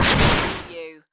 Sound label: Hit Metal